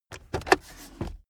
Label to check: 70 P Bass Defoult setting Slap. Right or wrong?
Wrong